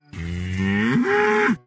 moo

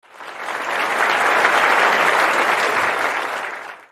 applause

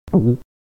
gulp

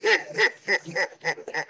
laugh; kraal; Evil; Various Evil Sounds

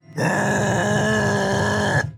dragon argh

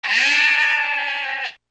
baa; Animal Sounds; Various Animals; Short calls

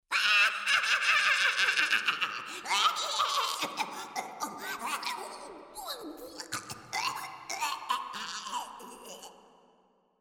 cackle; gulkaush

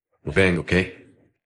bang

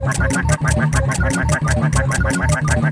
quack song fast

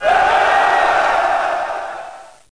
cheer

